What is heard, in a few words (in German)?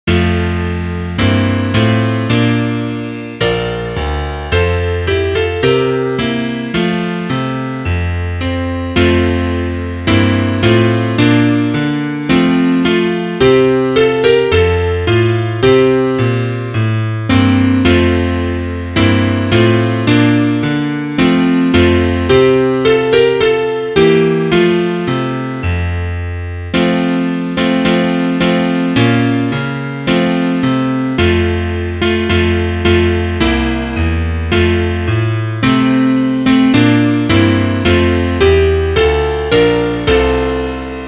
Klavier Solo, trompete; Etüde